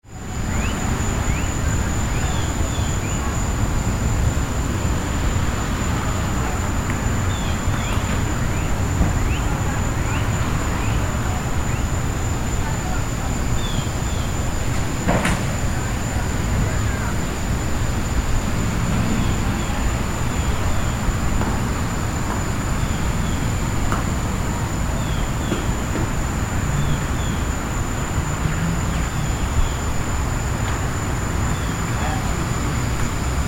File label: Suburban Park